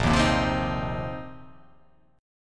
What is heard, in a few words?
A sharp Suspended 2nd Suspended 4th down